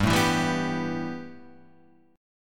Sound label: B 7th Suspended 4th Sharp 5th G